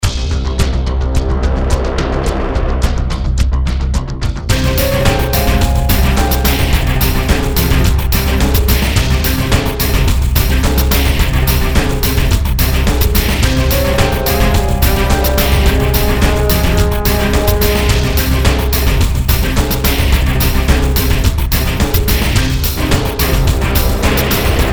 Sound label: Battle; Necrovision